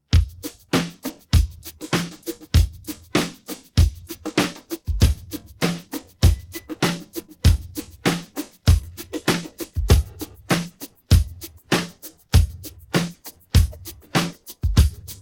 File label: pattern, drums